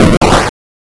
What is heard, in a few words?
explode